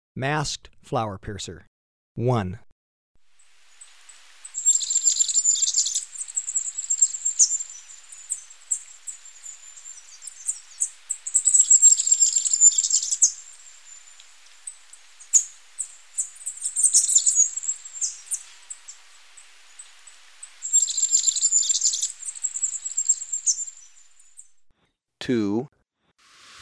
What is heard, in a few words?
Masked Flowerpiercer, Birds of Ecuador; S. America